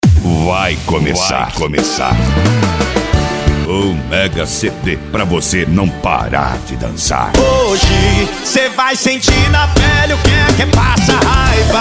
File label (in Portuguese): top bass esp de sertanejo